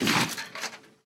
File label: 12mm dshk mech, guns tanks; War Thunder